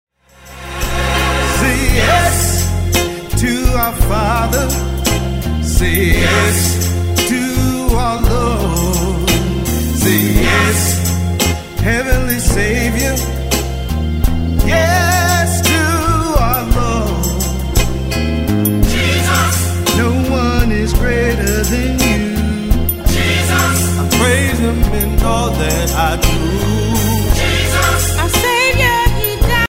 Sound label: Vocal